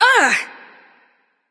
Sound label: grunt hit; smite; pele